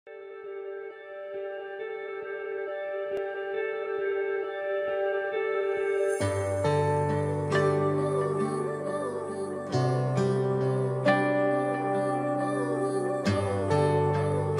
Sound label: music